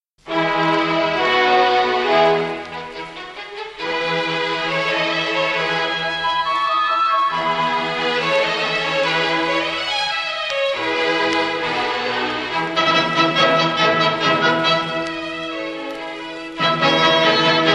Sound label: CONCERTO FOR TRUMPET AND ORCHESTRA